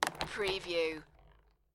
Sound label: Billiard, Pool, Snooker - Billiard ball falls into pocket